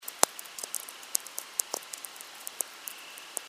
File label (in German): Feld Hinten ATM VLF, Natural Radio